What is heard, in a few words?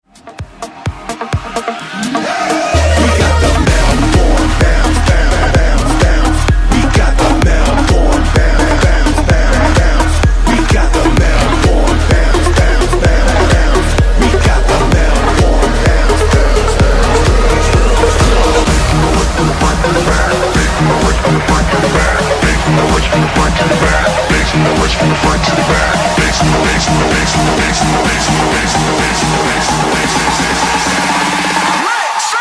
Noise Hype ReDrum 128Bpm; DJDOLLS